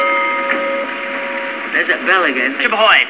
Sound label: bell